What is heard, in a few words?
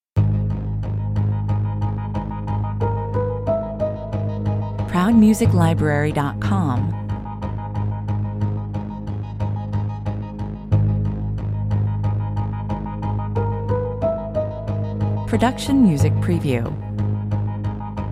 proceed with caution (no drums perc)